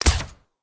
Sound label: pew